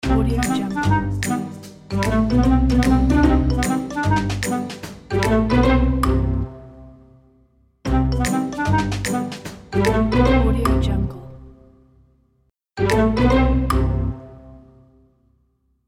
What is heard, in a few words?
comedy logo